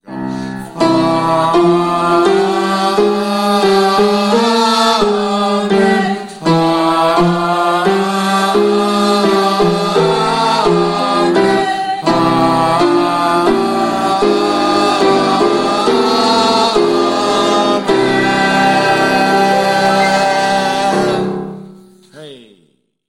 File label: Soprano Amens, choir